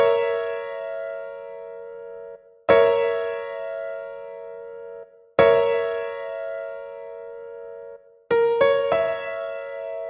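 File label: A sharp dim Piano Chord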